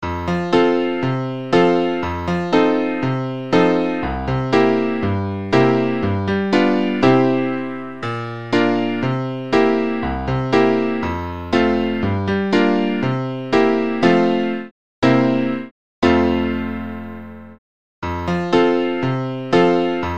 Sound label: accompaniment only